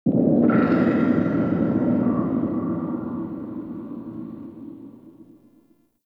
Exterior Deep Space Booms, Ambience; Warframe; Railjack

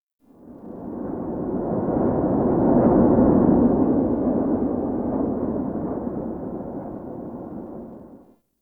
Forest Half Open Cave Wind; Ambience; Warframe; Grineer

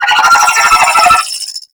Space Drone Attack; Warframe; Corpus Space Drone

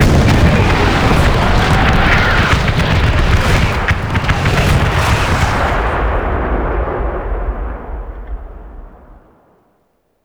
Artillery Launch Start; Warframe; NineteenNinetyNine; Stage12PartyOfALifetime

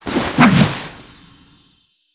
glyph ball throw; Warframe; Duviri; Glyph Puzzle

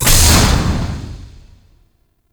Standard Door Open; Ambience; Gameplay; Warframe; Entrati

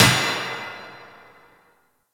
Worker Hammer Impact; Ambience; Props; Warframe; Venus Open World; Venus Solaris United

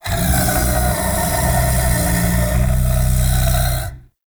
Player Lure Call, Animal Lure; Warframe; Deimos; Undazoa

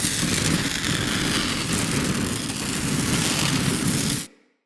Siege Engine Cannon Projectile Loop; Weapons; Enemy; Artillery; Ode Siege Engine

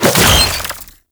Brood Spider Jump; Warframe; Oraxia

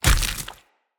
Claw Large Impact Flesh, Enemy Unarmed

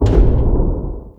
Step B; Erra; Sentient Throne Room Gameplay

